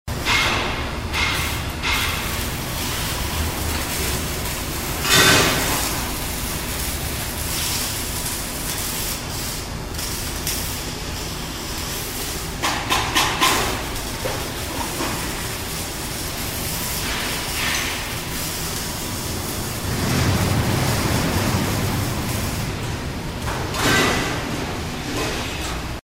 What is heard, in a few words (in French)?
son d'une usine